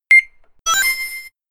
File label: scanning item